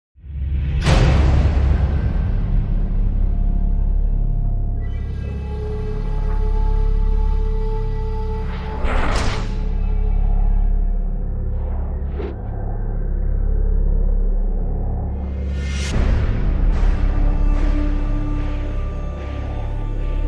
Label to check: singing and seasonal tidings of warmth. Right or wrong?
Wrong